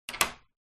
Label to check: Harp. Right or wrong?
Wrong